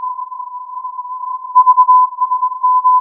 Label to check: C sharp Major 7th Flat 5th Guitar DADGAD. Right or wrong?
Wrong